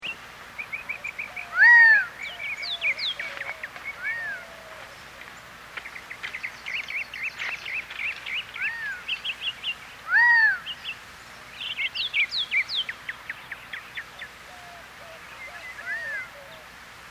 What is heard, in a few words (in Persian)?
صدای بلدرچین گامبلی